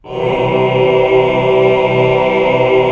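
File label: chord 2 3 L; Best Service Gigapack 1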